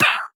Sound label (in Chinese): 鹦鹉死亡声